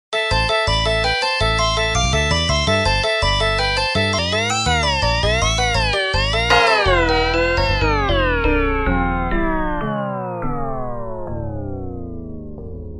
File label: halloween low bat